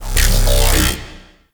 Alt Fire Growl, Weapons; Warframe; Corpus; Tenet Quanta

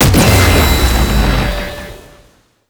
Defense Speaker Burst; Warframe; Nineteeen Ninety Nine

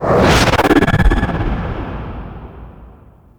Cast; Focus; Naramon

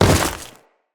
Step Ice, Footsteps; Enemies; Grineer

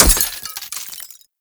Ocean Glass Crack; Warframe; Grineer Ocean

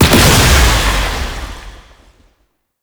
Void Fissure Close; Warframe; Entrati; Conquest

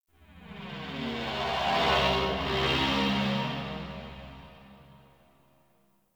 Corpus Ship Energy Moan B Texture Med; Textures

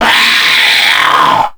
Zombie Attack; Grineer Mariner; Warframe

